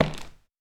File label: Light Sneaker